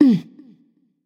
notice; smite; voicepack; medusa

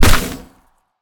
hit; smite; olorun

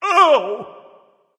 ouch, voice; Smite; ymir skin12

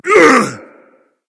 grunt attack berserk; cuchulainn

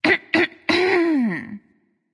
clear throat; smite; nuwa

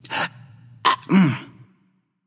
Thinking; smite; anhur

